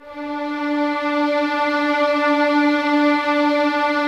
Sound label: D 4, 11 Violins, Soft; Miroslav Vitous